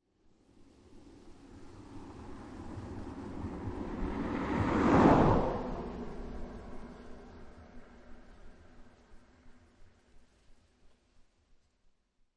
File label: Car By Country Road; Cars (Sound Effects:Vehicles)